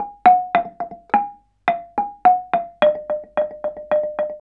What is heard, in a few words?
KULINTANG